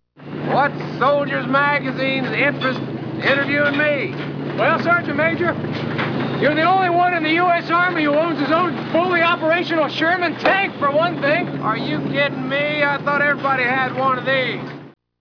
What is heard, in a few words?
tank